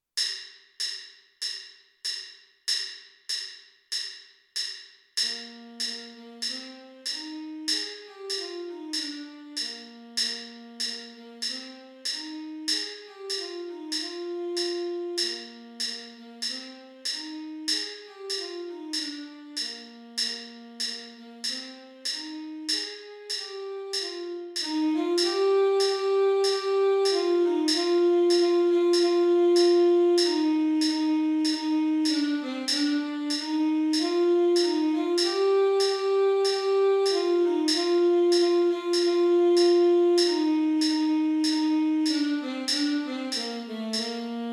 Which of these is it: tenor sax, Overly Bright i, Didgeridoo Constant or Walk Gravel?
tenor sax